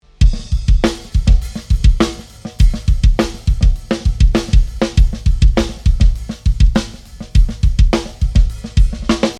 kick snare eq, batterie; style hard-métall; j'ai accentué un peu plus le kick et en renforçant le grave

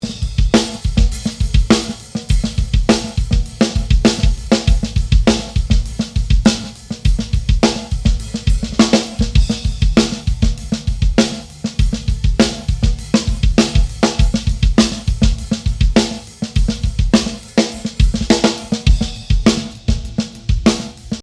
kick snare comp, batterie

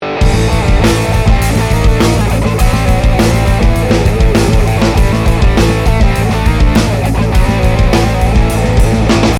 full over comp, batterie; Comment engraisser une batterie

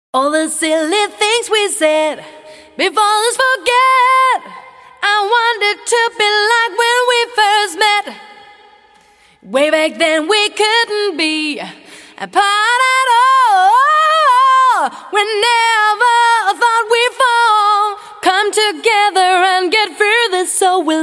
vox rev; le résultat avec compression

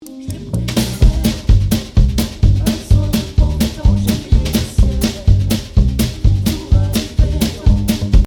kick snare all comp, batterie

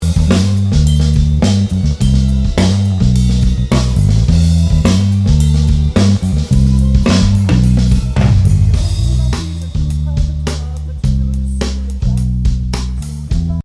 full over comp, batterie, basse; une forte compression sur les micros ambiance

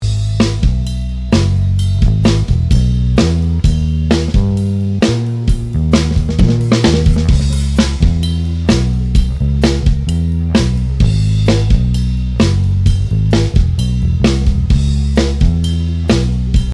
full add, batterie, basse; j'ajoute ici la basse; pour justifier l'égalisation surtout sur la grosse caisse

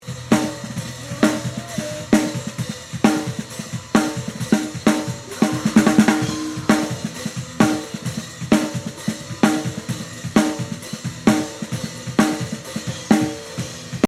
snare, batterie; j'y mets une grosse reverbe gaté; Reverb et panorama

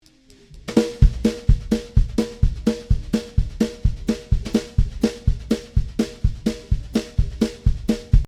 kick snare base, batterie; j'ai ajouté le micro dessous et j'ai compressé très légèrement les deux sons de caisse claire; on remarque une forte resonnance sur la caisse claire

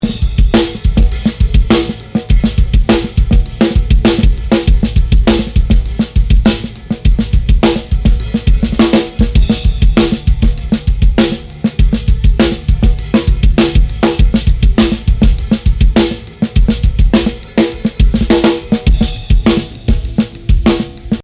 kick snare comp, grosse batteuse saturée

